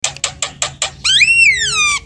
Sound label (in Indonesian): burung jalak kebo